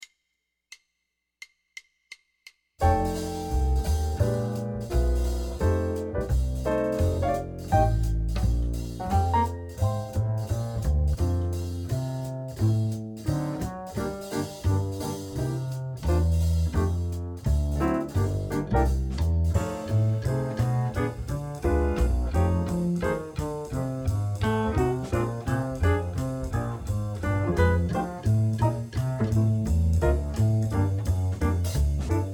Winds

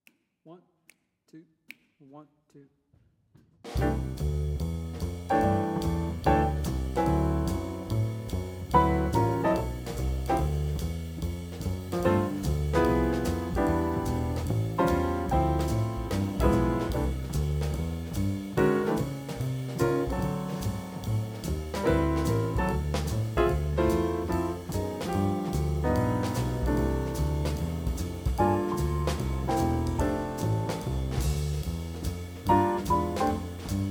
Guitar Vibes Accomp; 2024 Jazz Combo Guitar & Vibes Accomp

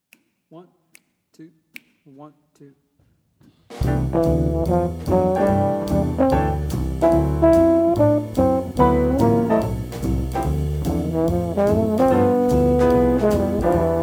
Bass Trombone

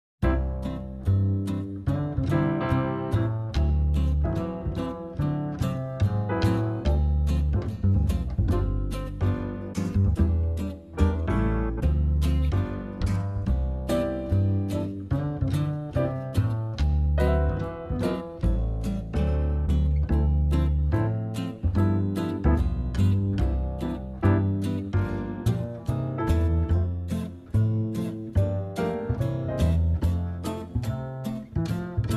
Drumset

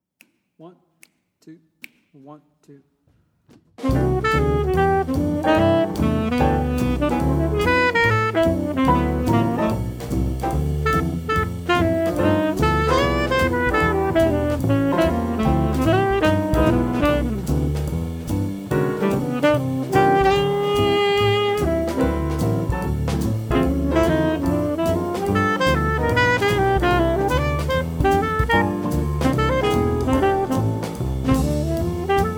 Tenor Sax

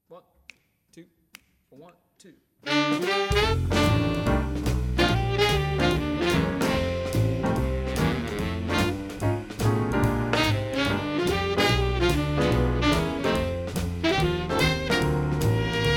Sax; 2018 All State Jazz Recording

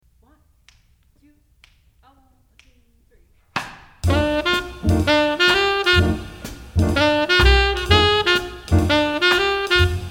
Blues tenor sax; All-State Jazz etude

